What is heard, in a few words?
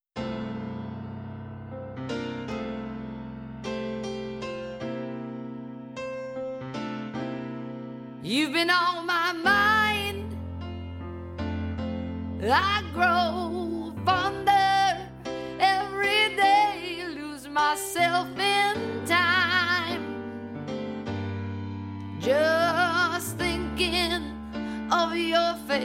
music wide; processamento de audio